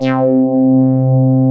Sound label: Smak Attack Lead, Synth Lead; Ableton; Factory Packs; Synth Essentials